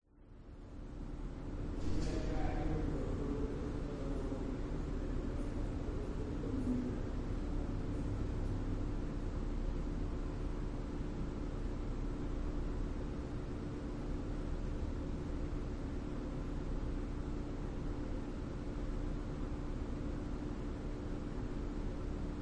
school hall roomtone few people slight distance corridor talking banging